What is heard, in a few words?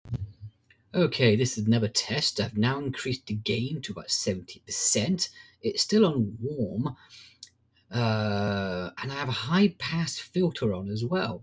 warm high pass